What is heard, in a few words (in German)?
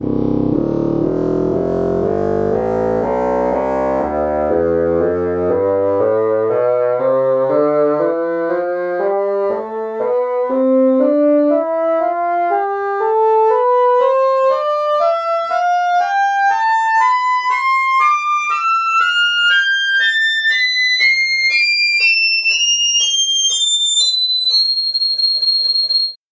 bassoon tonleiter